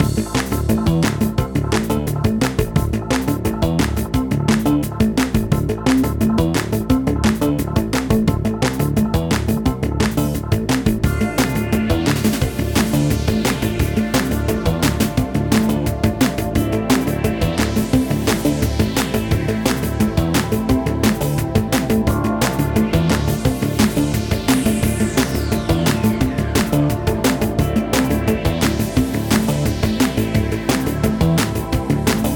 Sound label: level; prison run and gun